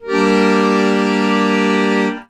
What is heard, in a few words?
AKORDE G MOL; ACCORD.CHRDS